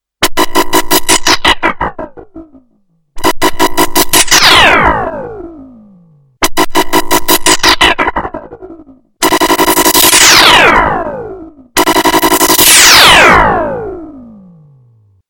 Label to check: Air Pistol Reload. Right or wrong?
Wrong